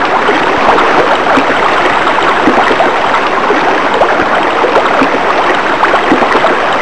amb spa

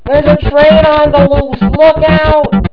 loose train

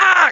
MINOR PAIN; cash

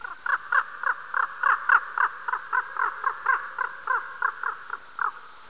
nightbirds

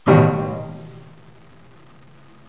chord

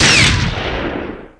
fire

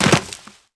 pl dirt land, player

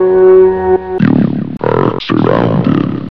flamebot alert

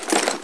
bag toss

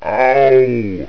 pain sound

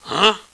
alert

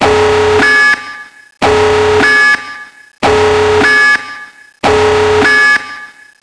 Base Alarm Loop

